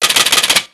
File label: rattle; bucky